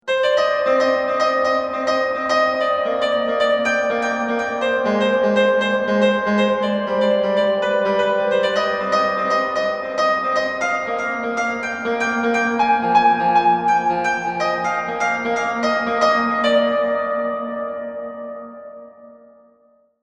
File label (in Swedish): piano melodi